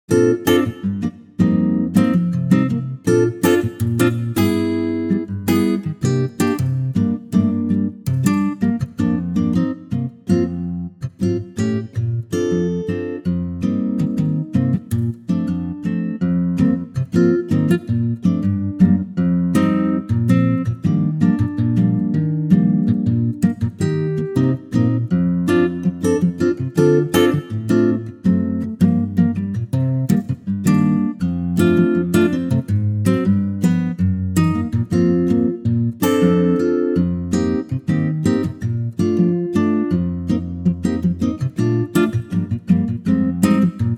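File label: Bb acoustic guitar; key - Bb - vocal range - Bb to Db (optional D top note); Latin acoustic guitar arrangement of this classic American standard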